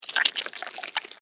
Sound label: Alien Creature, Scifi, Alien, Creature, Movement, Insect, Science, Fiction; BlastWave